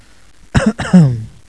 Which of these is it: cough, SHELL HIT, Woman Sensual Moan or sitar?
cough